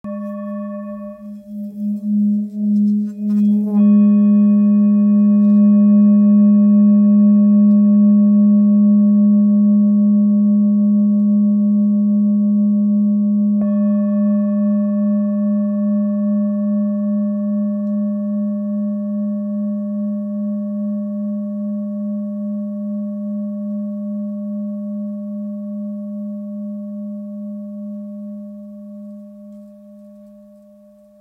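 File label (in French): Full moon sol diese, bol chantant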